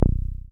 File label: SYN 303, Roland TB-303